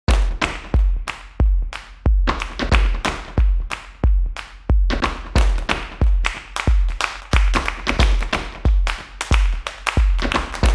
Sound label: clap beat; Jane the Virgin